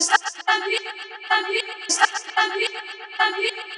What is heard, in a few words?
chopped vocals ping pong house delayed